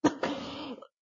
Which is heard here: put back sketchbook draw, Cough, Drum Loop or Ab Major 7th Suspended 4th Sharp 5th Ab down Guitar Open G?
Cough